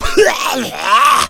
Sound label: smoker pain, zombie; Left 4 Fortress